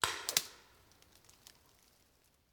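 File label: Paintball Gun Impact; Sound Ideas; Guns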